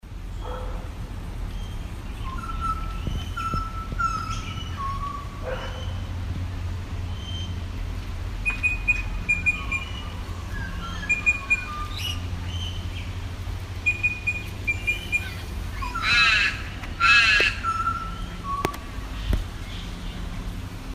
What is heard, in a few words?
Butcher Bird